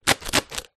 leisure party serpentine blowout; zapsplat

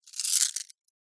gemstones handling grasp close hands; zapsplat

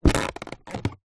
plastic creak squeak; foley

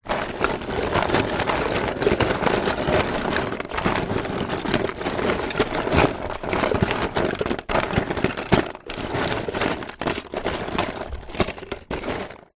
office stationery drawer rummage

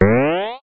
cartoon stretch elastic or boing

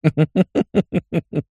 human male laugh snigger closed mouth

